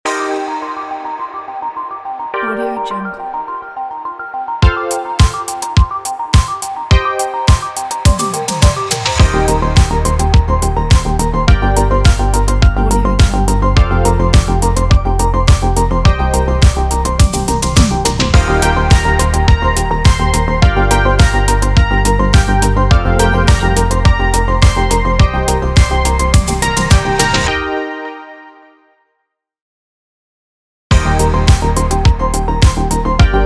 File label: 80s synthwave logo ident